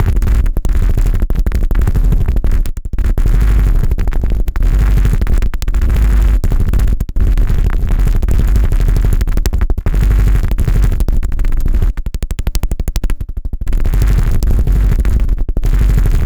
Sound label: kick explorations